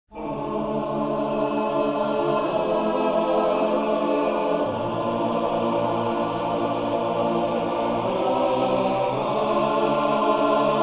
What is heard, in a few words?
Choir Only